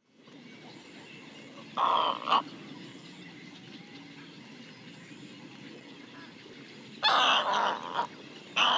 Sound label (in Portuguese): garça-branca-pequena